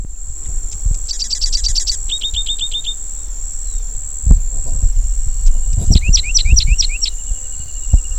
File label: Cistothorus platensis